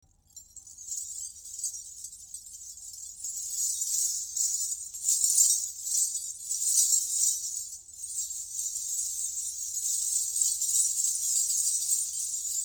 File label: salangai